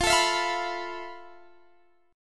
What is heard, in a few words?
F Major 7th Flat 5th, F, down, Guitar, Standard; strummed; JGuitar